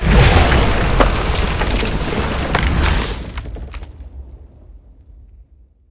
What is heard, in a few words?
portal; smite; hera